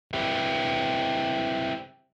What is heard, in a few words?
guitar dee pan L